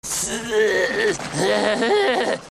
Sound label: SINGLE GRUNTING